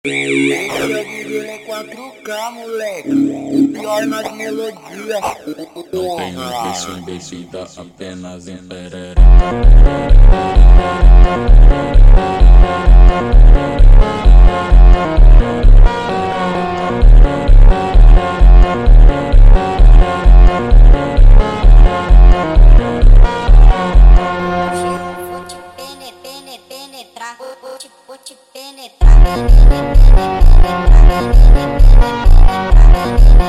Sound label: Melodia Orquestral slowed, funk